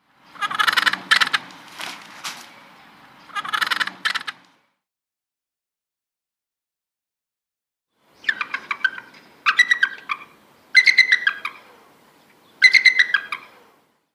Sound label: Lappet-faced Vulture